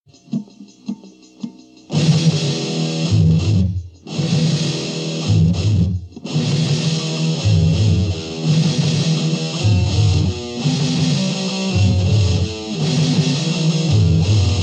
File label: Riff Tapes; From Riff Tapes